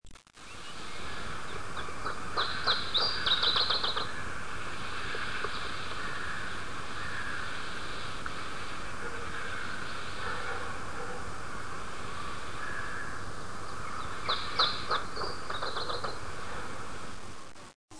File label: song netherlands, tristis